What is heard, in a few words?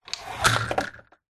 blind venetian drop down close fast hard; zapsplat